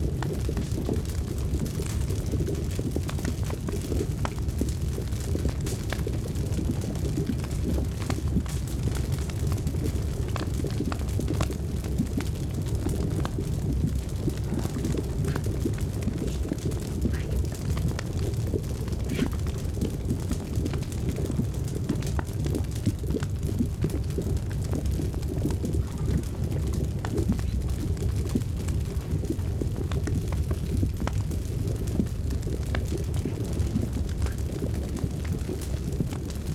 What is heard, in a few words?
plane of fire loop, ambiences; Michael Ghelfi